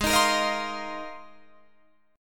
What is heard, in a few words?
G sharp 6th Add 9th G sharp Guitar Drop D